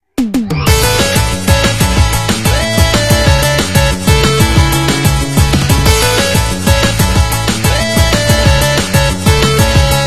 invincible; opensurge

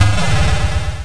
doors; mazeofgalious

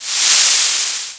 water shield; opensurge

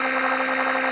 crococopter; opensurge

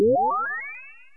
open; mtp-target

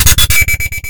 shield; mazeofgalious; jorito

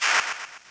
water out; opensurge

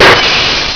Smash; stuntcar

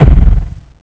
floor hit; opensurge

